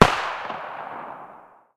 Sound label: firework Explosion; shangrila